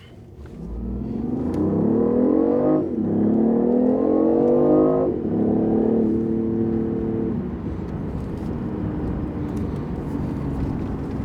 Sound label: BMW i8 Sport mode 0-100kmh inside the car